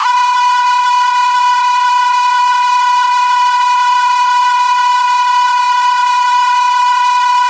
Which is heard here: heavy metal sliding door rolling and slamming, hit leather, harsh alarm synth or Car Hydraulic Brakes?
harsh alarm synth